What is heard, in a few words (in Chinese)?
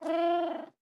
豹猫待机声